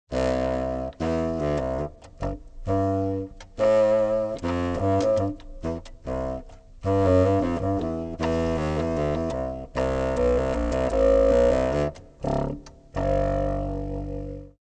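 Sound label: double bassoon solo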